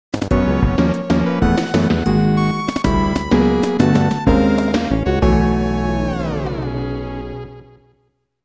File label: Goal Tape; SCP Tape